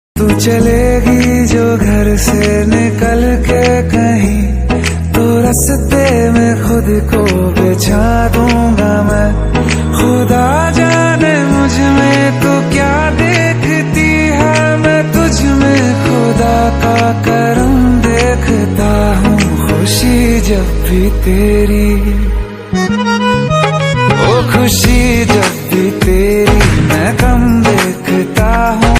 hindi ringtone

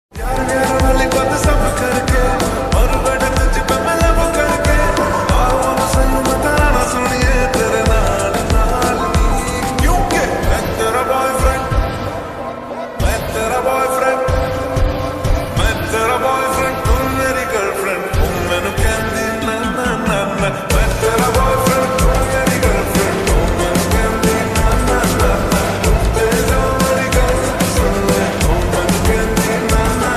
slowed ringtone

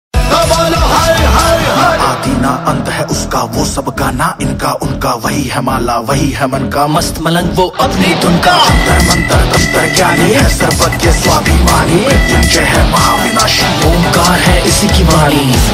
rap ringtone

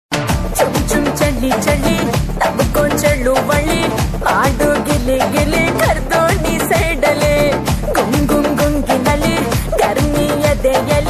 Tamil ringtone

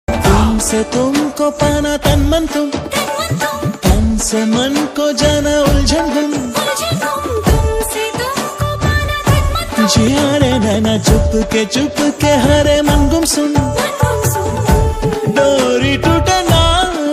ringtone

